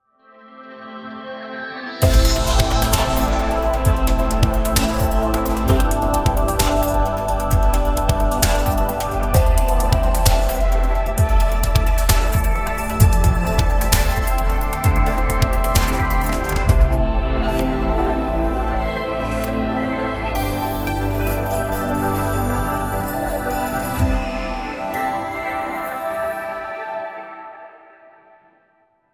ambient house new age meditation advertisement 808 drum machine 808 kick claps shaker synt; synthesizer